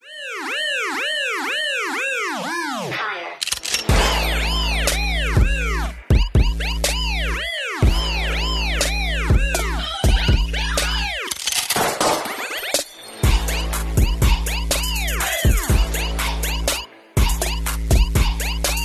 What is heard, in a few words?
Bike Siren Remix Instrumental Ringtone